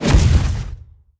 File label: Basic Woosh